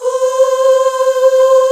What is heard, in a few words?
MIDDLE OOHS